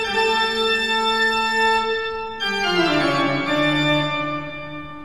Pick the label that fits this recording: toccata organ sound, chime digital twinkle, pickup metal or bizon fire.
toccata organ sound